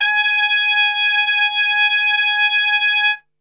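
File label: G#3 piccolo trumpet, Brass; Best Service; Brass Super Section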